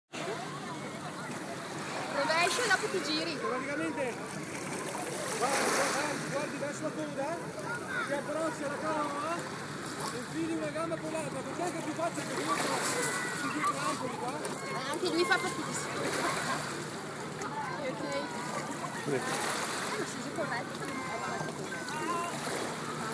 Ambient: Riva del Garda Lido, Waves, swimmers and everyone around, North Lake Garda